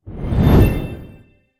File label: snake Symbol Rotates; hiddencoinsofegypt